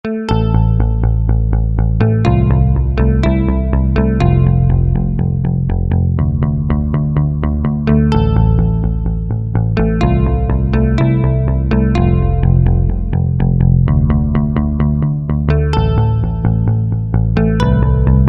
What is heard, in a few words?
Roundwound, Alesis QS8; Factory Preset examples